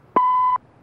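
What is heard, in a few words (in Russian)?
звук начала записи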